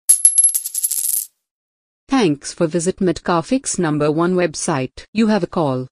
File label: bleep